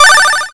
bonus Mono

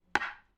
Plank Falling

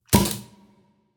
Single Nerf Shot

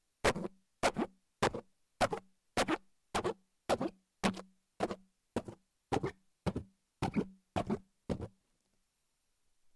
Cartoon Bounce

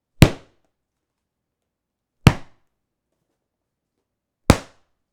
Hard Impact Hit

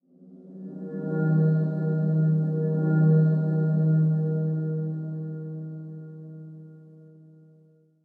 Violin Glissando

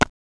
Hit Wood

